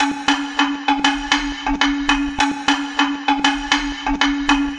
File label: Mighty Klong, Acoustic Beats; Sonic Foundry (Sony Creative Software); Myths of Technology